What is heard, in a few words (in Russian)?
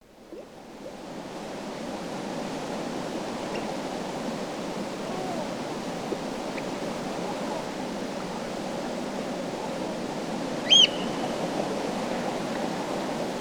zvuki prirody vesennei noch yu na ozere; vesennii sbornik